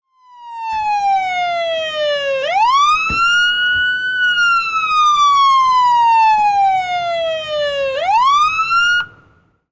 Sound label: police siren